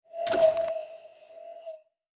hint; World of Tanks Blitz; GUI

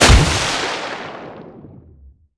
torpedo; World Of Warships; weapons

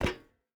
footstep boots metal run; Rust

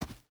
footstep boots sand run; Rust

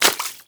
foot mud, footsteps

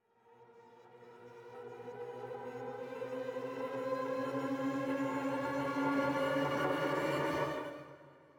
music interactive; World of Tanks

